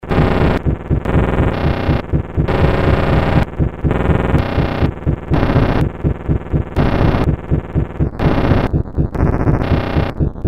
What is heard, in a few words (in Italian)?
noise ritmo